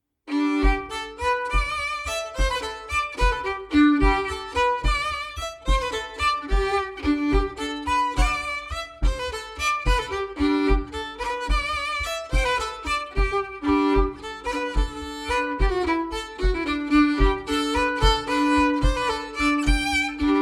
Bourree d Auvergne; Bourrees et autres danses antiques publiees par Elie Dupeyrat

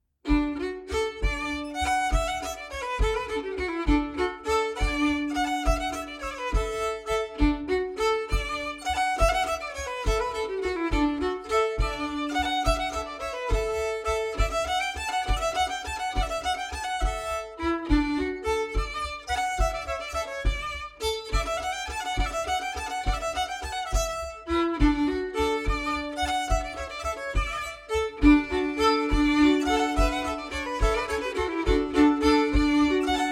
Bourree d Auvergne; Lepetit Francois; Bourrees et autres danses antiques publiees par Elie Dupeyrat

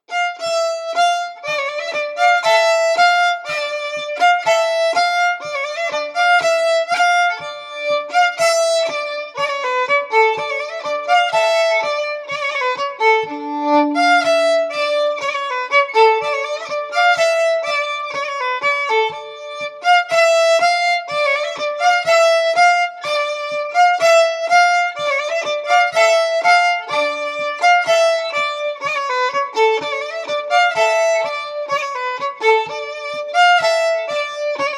Bourree

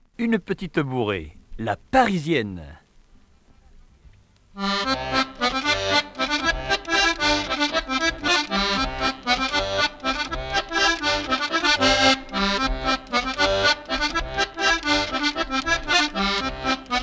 Folklore du Bas Limousin; La parisienne d Edgard; Les Pastoureaux de La Couze; La parisienne bourre

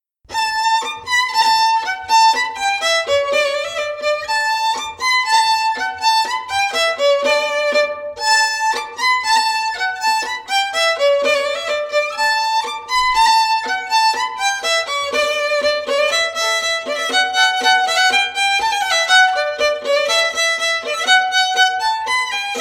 Bourree; Recueil Hainl; Bourree d Issoire 2nd air

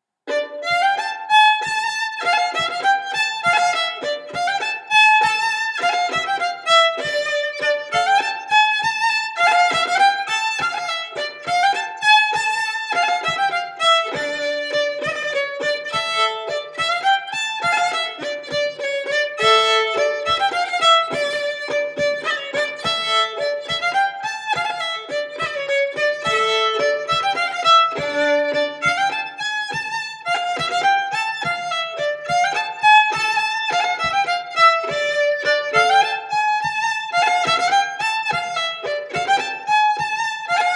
Montagnarde; Recueil Hainl; Montagnarde de Sauxillanges

